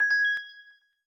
crystal unlock, phonetones, LG; LG Wine Smart F480K

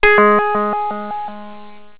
Koto Effect, Cisco Unified IP Phone 7945G

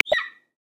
Golden Eagle; Xiaomi Redmi-Note-8-Pro; South West Mountain

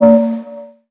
hum, notifications; Nothing Phone (2)

